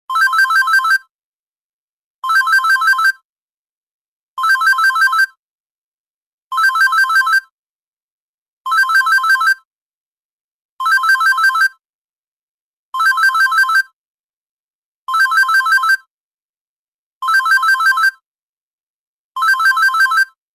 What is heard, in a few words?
lg optimus black p970 alarm beep; alarm beeping sound